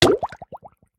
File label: drown, player hurt; minecraft; entity, player